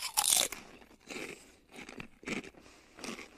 eating sound effect

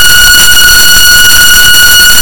very quiet nice sound

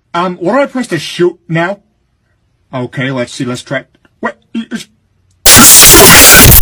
bass boosted; peter griffin

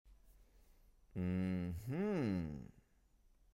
ahammmmm

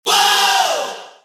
whoahh

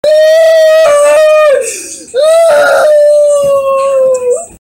crying

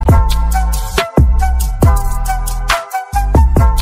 intro outro music

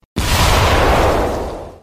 stand punch

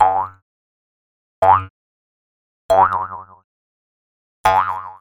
spring

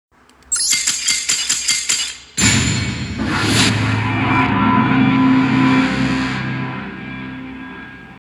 player defeat

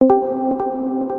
discord join reverb

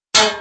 clank

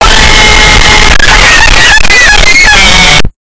laughing cat bass boosted

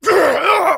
death

